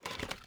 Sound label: ui pickup plastic junk; Rust